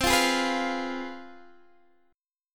C Major 7th Suspended 4th Sharp 5th, C, down, Guitar, Open D